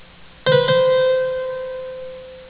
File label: Mordant (mordent)